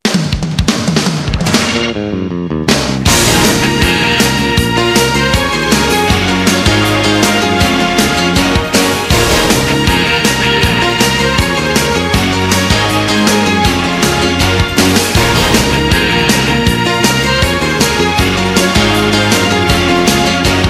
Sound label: baton music